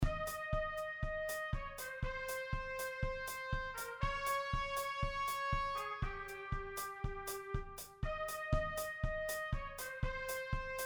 trompette

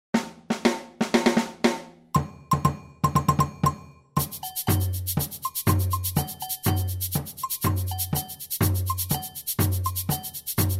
batucada agogo

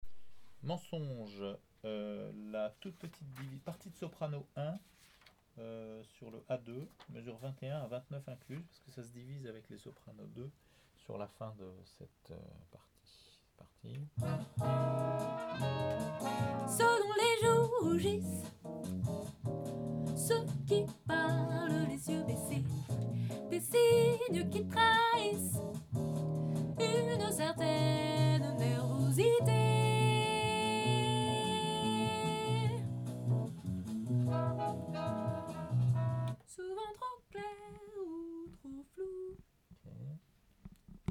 Sop 2; Pinocchio

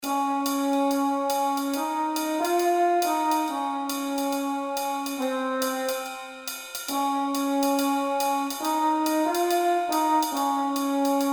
morceau orchestre trombone

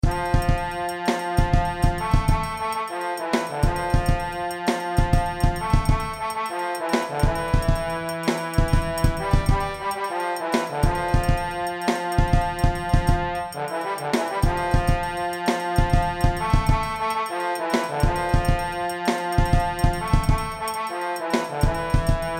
Trombone percussions

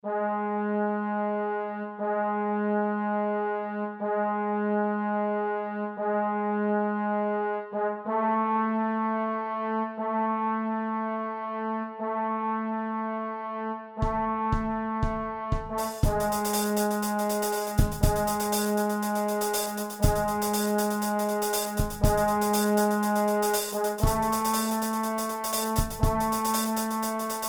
Trombone Batterie

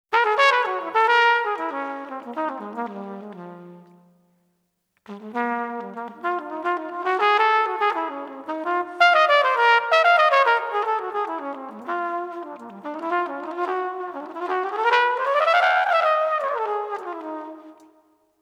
trompette

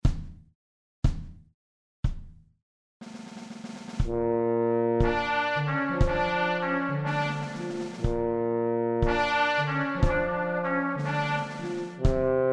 betterie tuba trompette

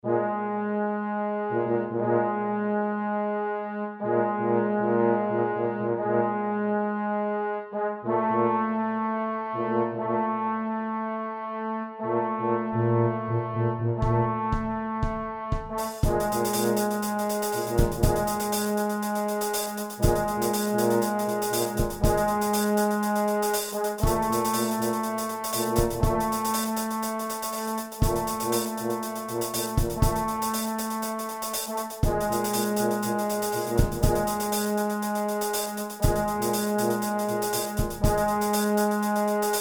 Trombone Tuba Batterie

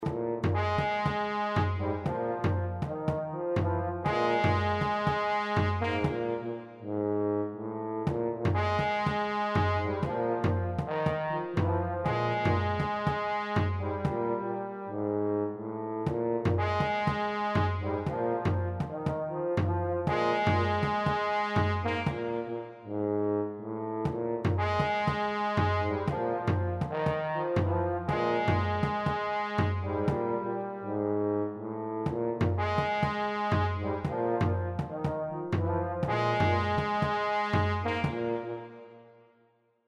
trombone tuba

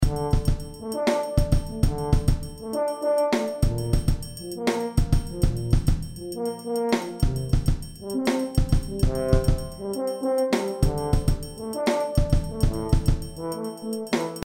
Tuba batterie

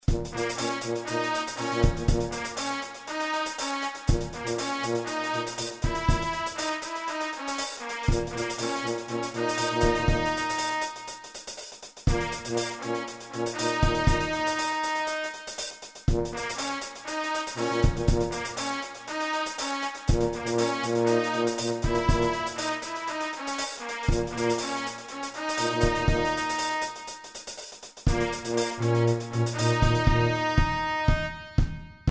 Trompette Tuba Batterie

